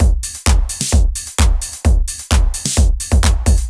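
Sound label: Bryggeri Drums B, евродэнс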